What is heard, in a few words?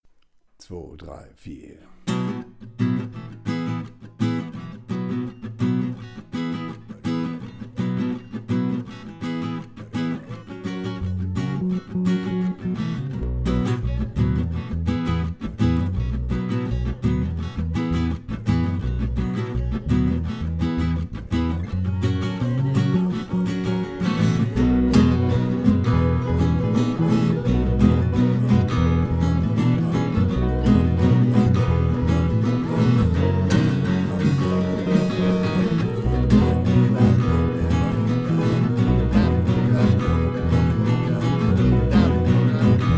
instrumental